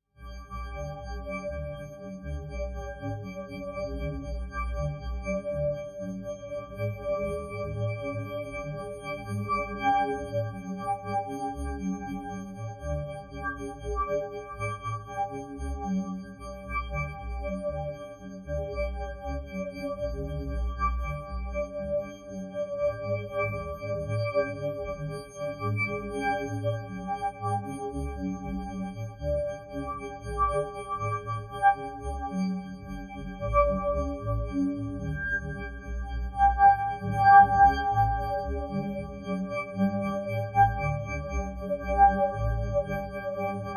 Badinerie with Spectral Filterbank; SOUNDMAGIC SPECTRAL; Michael Norris

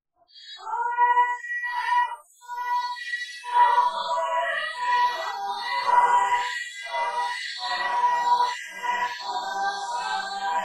rope spectral granulation; Women's Song with Spectral Granulation; Michael Norris

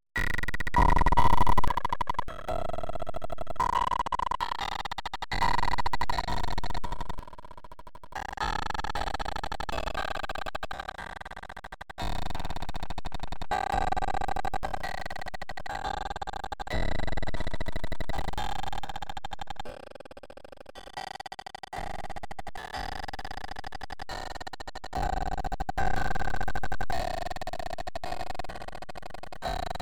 rope grain streamer; Women's Song with Grain Streamer; Michael Norris

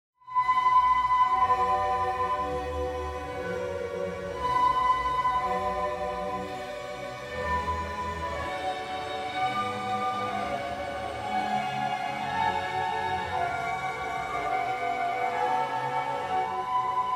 Badinerie with Spectral Blurring; SOUNDMAGIC SPECTRAL; Michael Norris

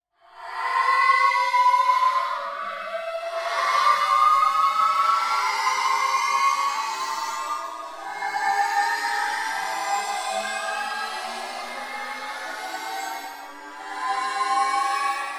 rope spectral partial glide; Women's Song with Spectral Partial Glide; Michael Norris

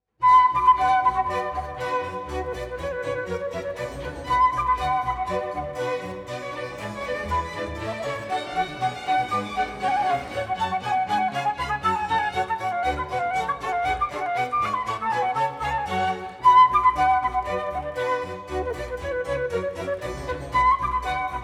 Badinerie from Bach’s Suite in B Minor; Michael Norris

